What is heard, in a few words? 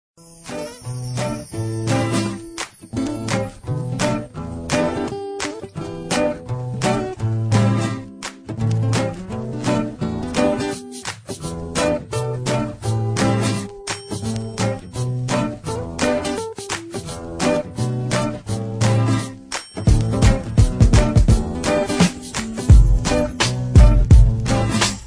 instrumental